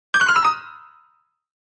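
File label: Piano Fast Comedy; keyboards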